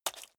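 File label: step water single left, footsteps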